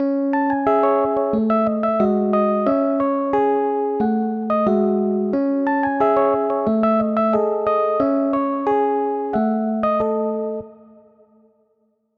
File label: Hybrid Advanced Neo Soul; midi wizard 2; Unison